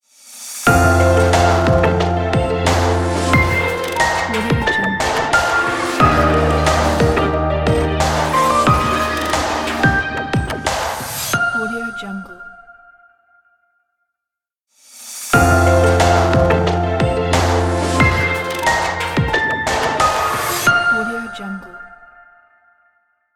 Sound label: glitchy fashion logo